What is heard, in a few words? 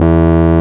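RMI piano E 1, EP; Sonic Reality - Vintage Timetraveler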